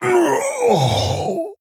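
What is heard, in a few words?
Male Pandaren Death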